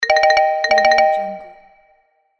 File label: ringtone